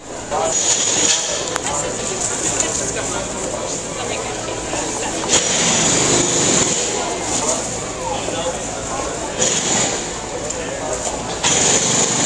high street digging

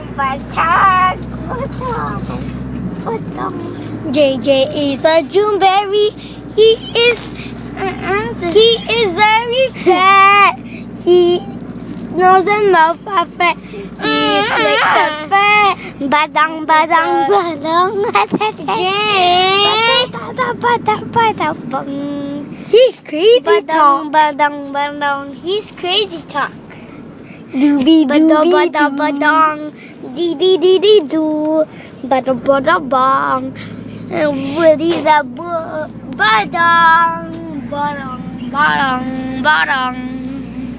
singing in taxi

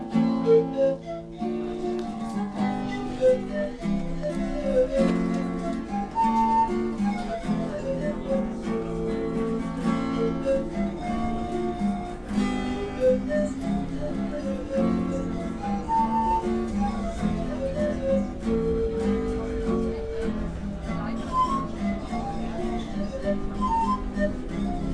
guitar and panflute

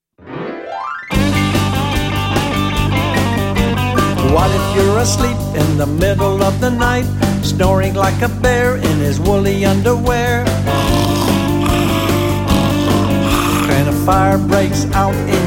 the smoke alarm song

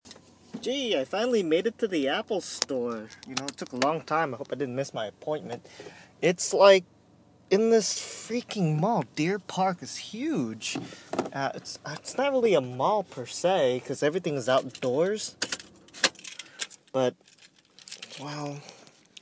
apple store; in deer park

